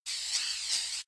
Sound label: spray